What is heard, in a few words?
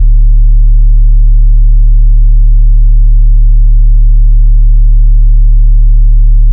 SUB, 808s